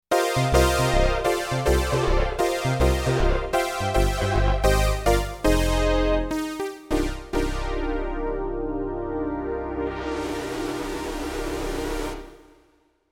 stereo poly synth

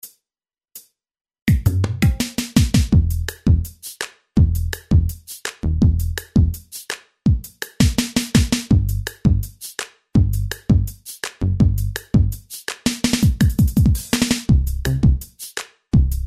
street hop

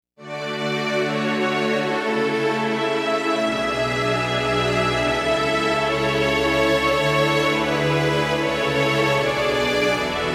slow strings; Juno-Gi

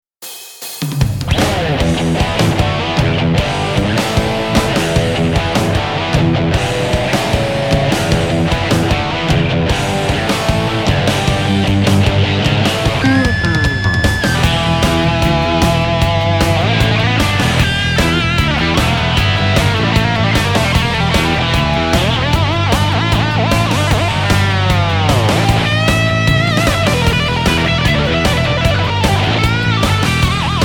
brown sound

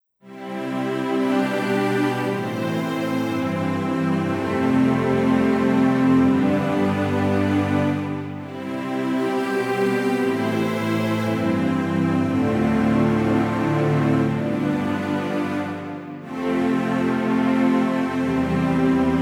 strings 2

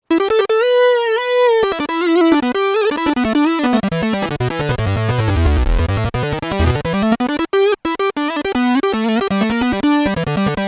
Dist Guitar

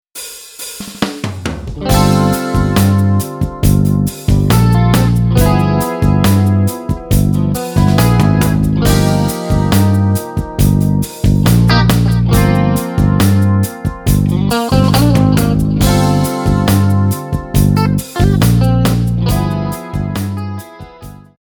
patch demo

